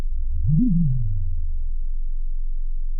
Sound of an owl

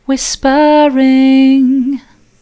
whispering soft

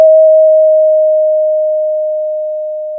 pop, Sound of a school bell

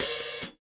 K3 Op Hat, drum

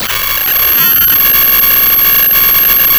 pop, Sound of a cat meowing

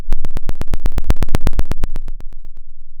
Sound of a dinosaur

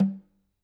K2 room Snr Off

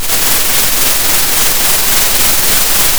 pop, Sound of a thunderstorm

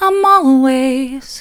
i'm allways soft, vocal

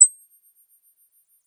Sound of a bird tweeting nicely

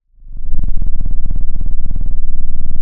pop, Sound of a cat purring

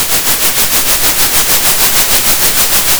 center, Sound of a train

